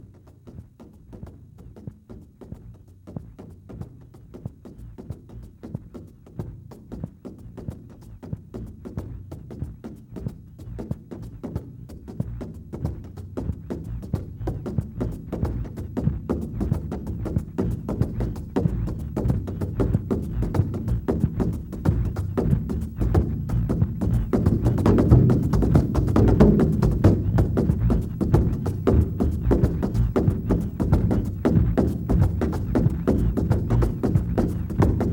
heartbeat drumbeat

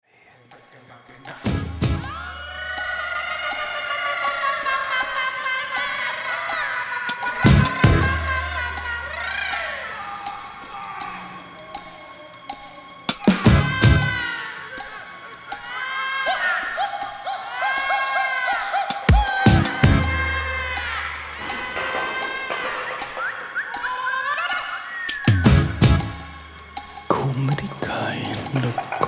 Drums

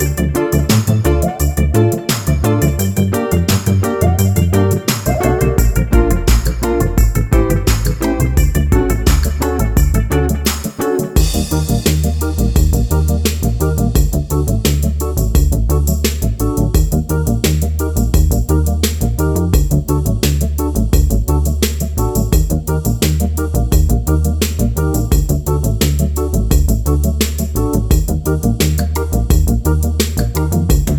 Instrumental

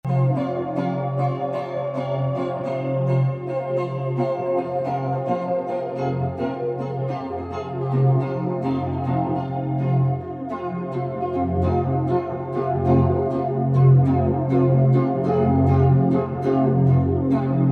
guitar

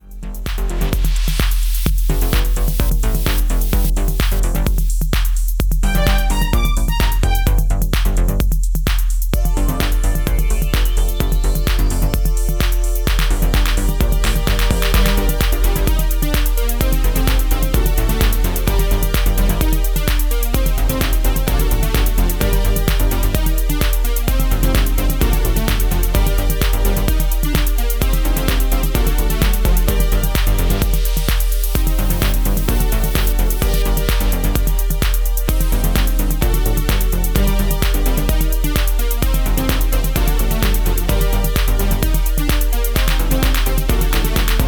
Synth pop

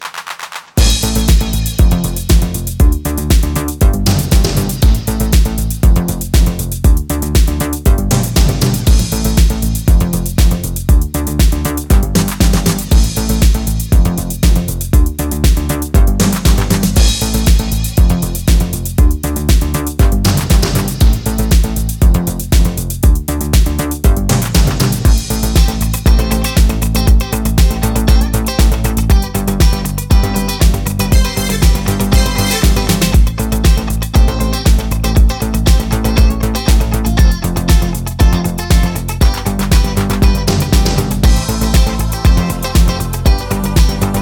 DANCE INSTRUMENTAL MIX

